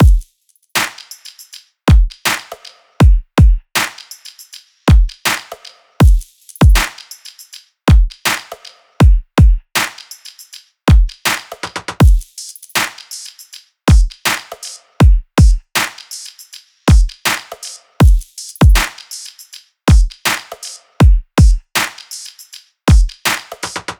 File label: loop full 80; Seismic Loops